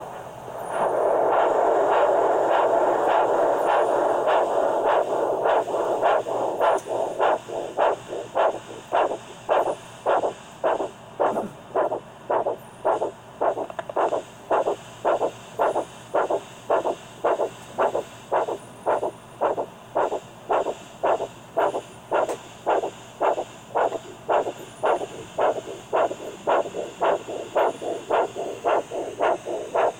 heartbeat

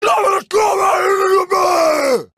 scream

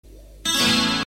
Alert Tone

